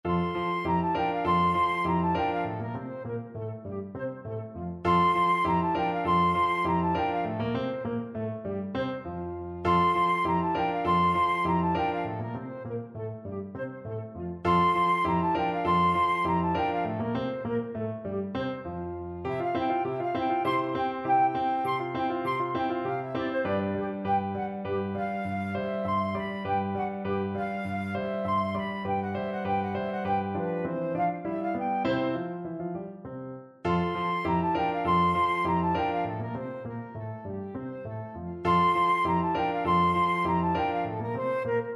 musette, flute

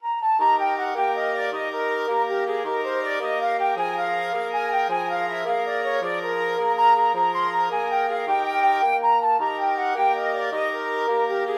flexible mixed ensemble 4 players

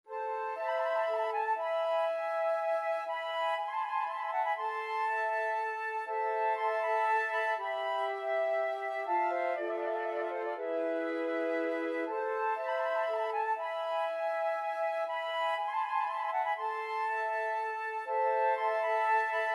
flute quartet

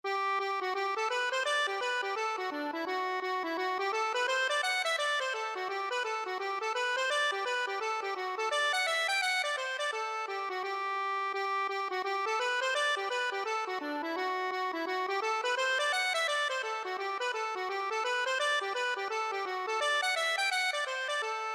hornpipe, accordion

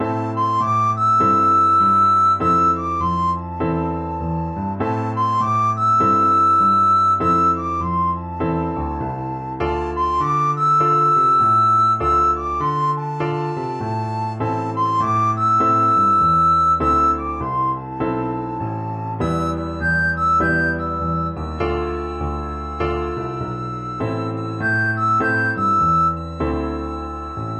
recorder

